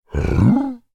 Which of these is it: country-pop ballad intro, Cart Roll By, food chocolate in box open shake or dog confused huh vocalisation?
dog confused huh vocalisation